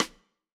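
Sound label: Snare Stick, drum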